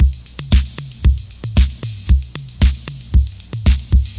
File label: 115 808 heaven rock, DRUM LOOPS and BREAKS